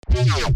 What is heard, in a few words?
harmor bass